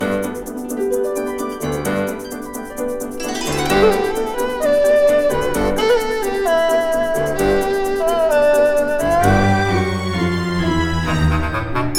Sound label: Music Intro; Gampelay Valentine; rakoo friends wii u 2015